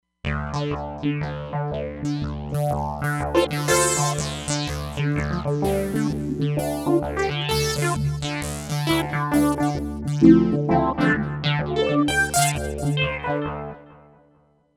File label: Saw Keystep, Roland Fantom XR